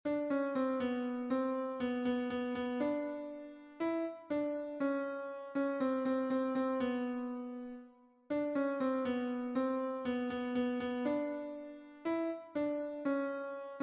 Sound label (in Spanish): tenor, himnos en piano; cantos del camino